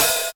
original open hi hat e key, Royality free open hat one shot tuned to the E note